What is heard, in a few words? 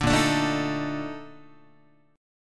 A sharp Major 11th Guitar, chord; A#M11; strummed; JGuitar